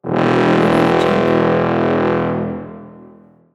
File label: castle horn blast